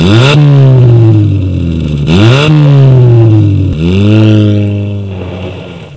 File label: hot honda